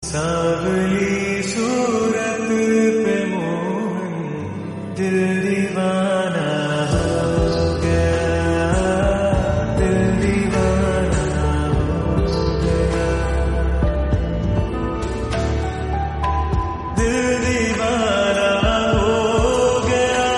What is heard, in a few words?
LOFI RINGTONE, KRISHNA